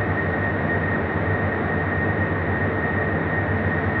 InfiniVerb Noise, sparse soundscape Loops

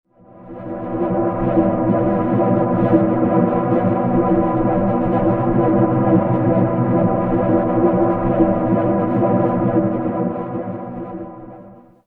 Pillar Drone E; Zero-G Seismic Frequencies; Ancient Drones; 90 s Sample CDs

